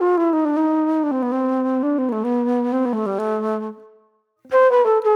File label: Mellow Flute